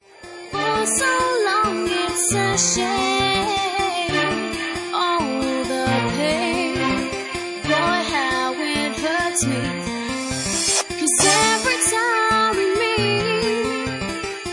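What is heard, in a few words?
Bassline Remix, Bassline House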